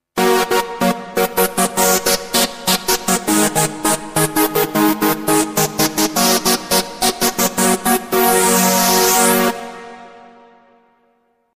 G2 Trancer

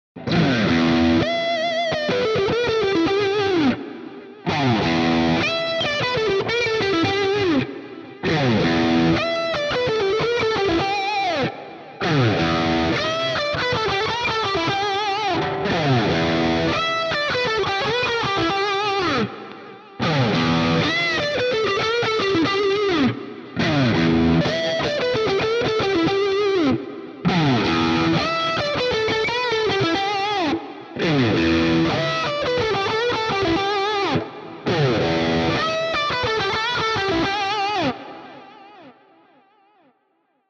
Ibanez AZ SDB high gain Lead all positions

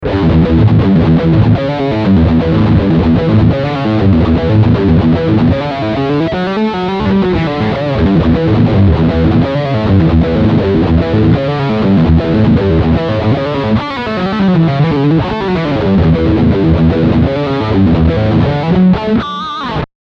Riff humbucker rear

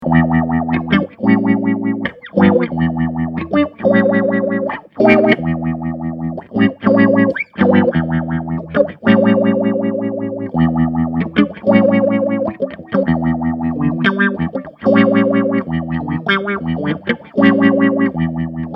filter

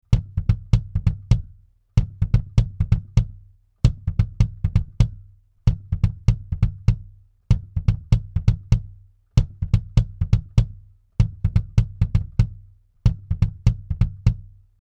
Mic Pre BD 1500 Ohm; Fredenstein Artistic

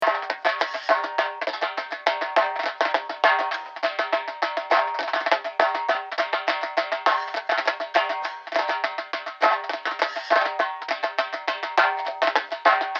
arabic percs IN

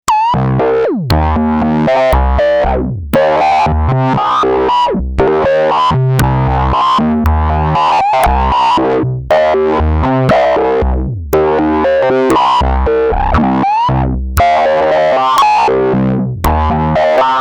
ms20mini Lead Bass Mel

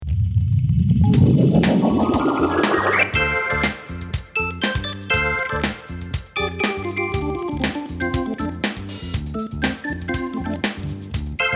Hammond XK 4 Vintage C3

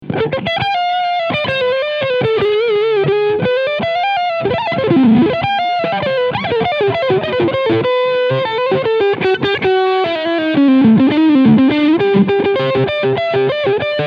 Squier Strat Leadsound Front Pickup; Squier Strat 40th Anniv W2CSB

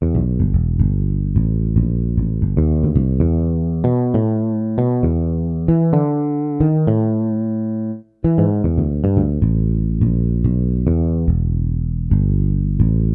Fretless Bass

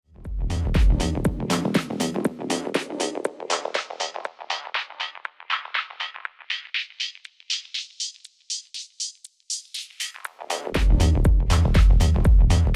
Sweep; PLX 500

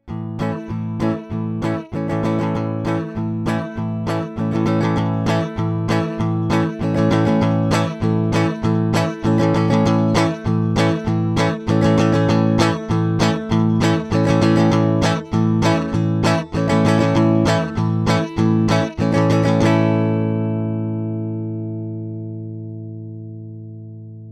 Git Strumming Impedance low to high; Cloud CL-Zi

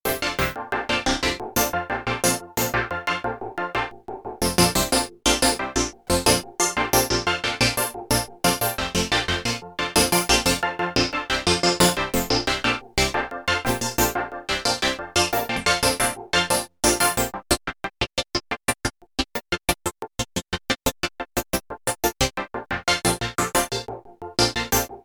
OB-8 grain; TastyChips GR-1; grain parameter

